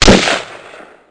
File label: M16 Single Shot x2 speed, gun